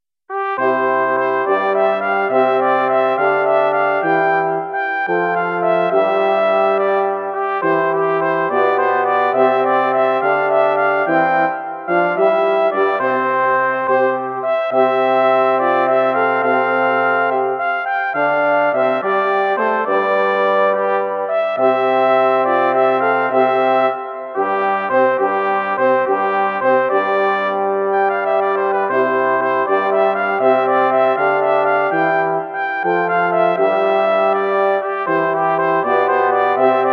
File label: fanfare